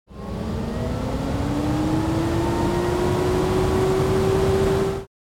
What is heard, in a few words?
ac compressor